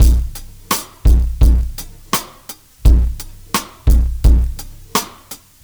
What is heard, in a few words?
R&B Drums